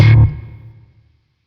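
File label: Sentient Shawzin Slap Octave One D; Chords; Warframe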